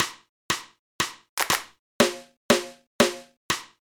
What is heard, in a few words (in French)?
laride